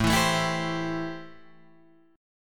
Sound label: A-Minor 7th A Guitar Drop D